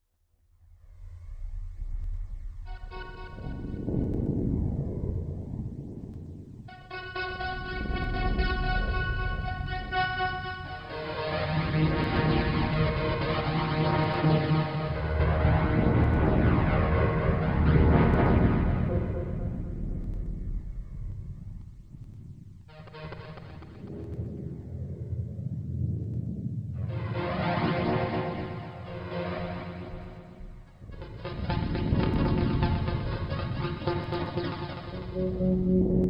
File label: Eb Harmonic Minor 60, Deconstruction